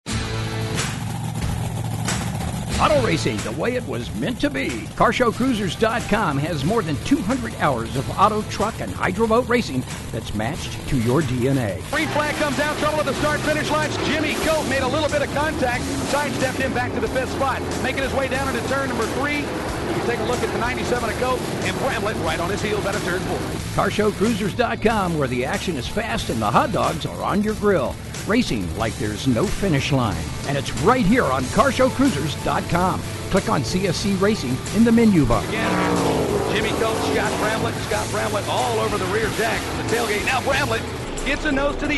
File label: RACING MEDLEY